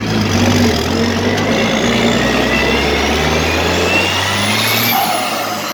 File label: turbo